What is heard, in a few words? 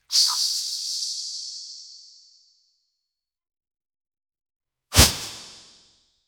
gore